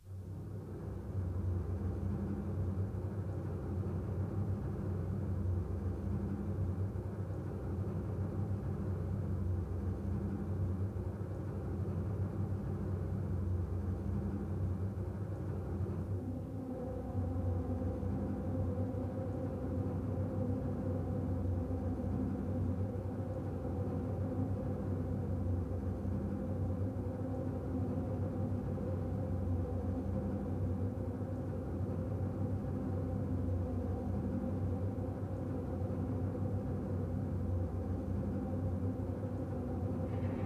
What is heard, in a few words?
Dark Ambient; Free 25 Fantasy RPG Tracks Vol. 1